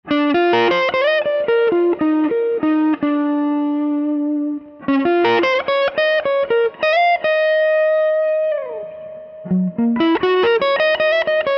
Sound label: Fuchs Tripledrive Supreme